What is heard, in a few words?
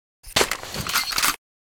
ventil unload, weapon; metrocraft